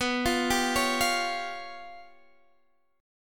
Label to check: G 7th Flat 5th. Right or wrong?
Right